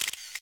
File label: camera; NEC Casio GzOne Commando 4G LTE C811